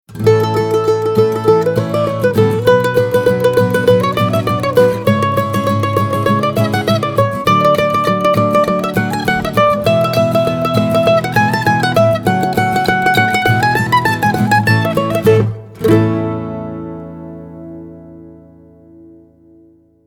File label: Mando